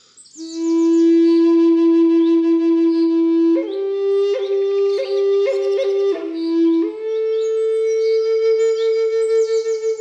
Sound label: Lakota key fa middle, flute